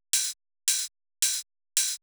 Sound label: Open Hats Loop 110Bpm; House; Ghosthack Advent Calendar; House Kit; House Construction Kit